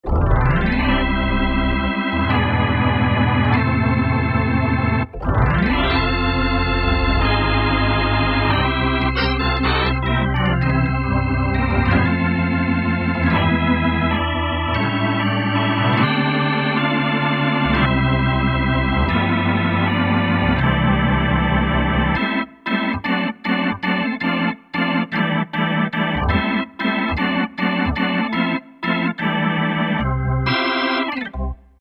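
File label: House Organ, Alesis Z2 EuroDance; HouseOrgn3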